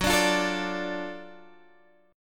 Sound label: F sharp Minor Major 7th Sharp 5th, Guitar Drop D; chordshape; down